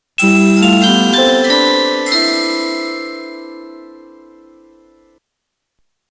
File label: 44.1k 4ADPCM music